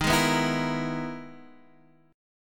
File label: E Major Sharp 11th D sharp